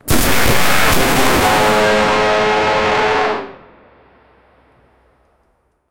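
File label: airhorn blast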